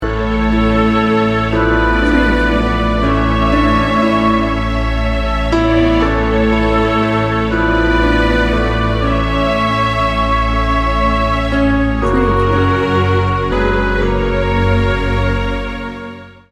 cinematic orchestral

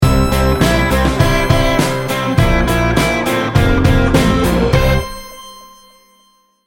laidback indie rock sting bumper

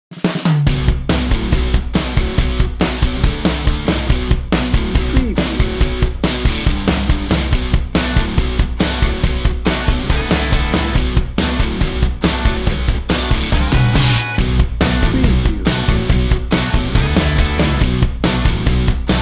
night work no lead guitar

